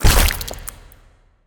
Explosion Final; Boss Presid Cthulhu

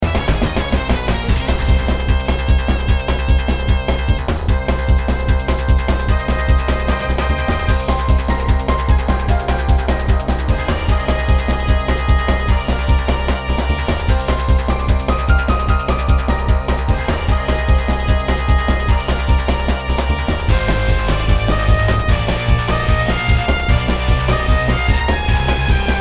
chase; Freedom Planet gamerip

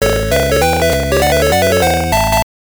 Puzzle Mode Stage Clear; pac attack pac panic 1994 game boy

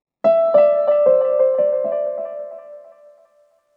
One shot Piano

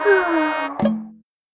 Sound Bomber - Lose; Mario Artist; Polygon Studio; N64

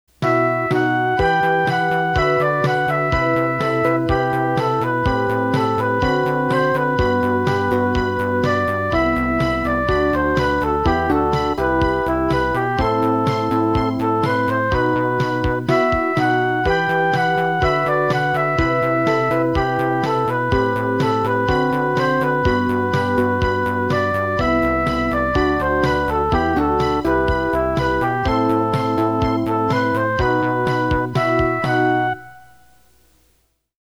Synthesizer Version; Super Famicom Magazine Volume 12; Nagoya Castle

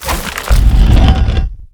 Key open; etrian odyssey iii hd

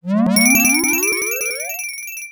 Door; marvel land talmit's adventure genesis

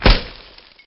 Shotgun Spray Impact; xbox 360; gamerip; castleminer z

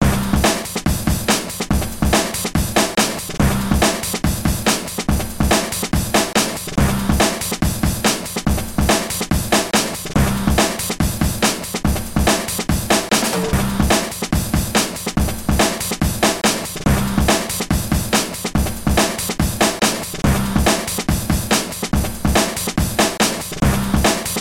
sea battle middle boss

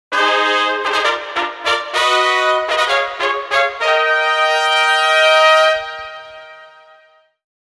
Victory Fanfare

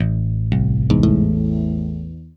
Tombstone Melody; Haven Forest

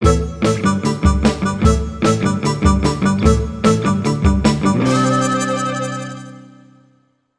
Bari Sax

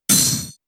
Detect shield hit; pokemon sfx gen 7 attack moves sumo usum

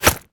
Bullet Impact Concrete Brick; jasem just another shooter with electronic music windows 2017

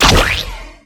Splat Gun; timez attack; Ogre

